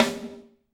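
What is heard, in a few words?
snare drum, Drums; Bob Clearmountain Drums I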